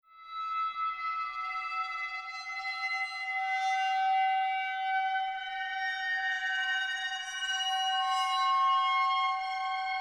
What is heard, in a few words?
Horror sound